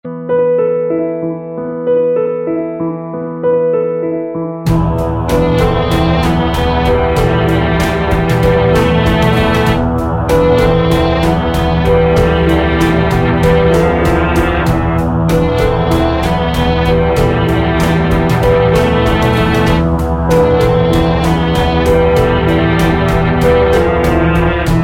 melancholy requiem

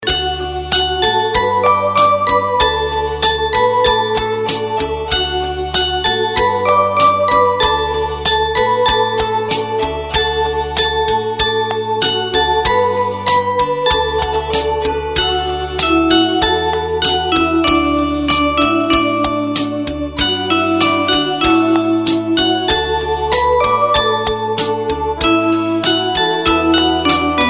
china ring, ringtones electronic

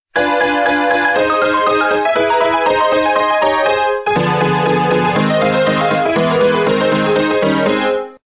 old piano

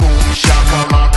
top boom fantastic, hip hop ringtone

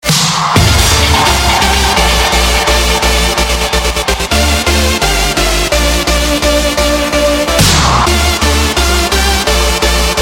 vocal house, electronic ringtones

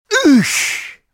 oooosh; ringtones; sms

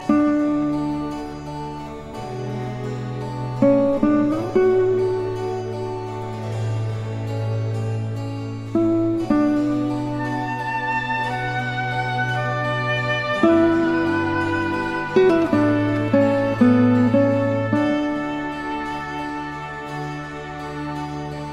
a great guitarist

